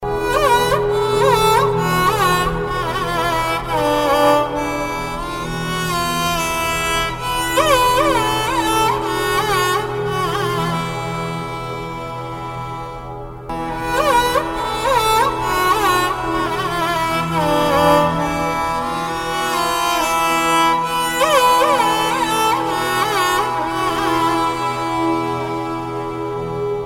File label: Violin